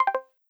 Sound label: Close Or Disable, Modern UI SFX; Slides And Transitions